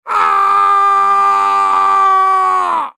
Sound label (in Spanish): grito